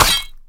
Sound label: boss fight damaged sparks